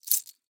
Apparel, bracelet, silver, ceramic, glass, leather, shake, single, bright, alternate; Eiravaein Works